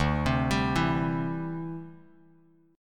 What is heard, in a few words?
D 9th Suspended 4th D down Bass Standard; arpeggiated; JGuitar